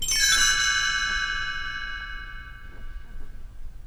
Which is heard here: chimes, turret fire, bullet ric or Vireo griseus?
chimes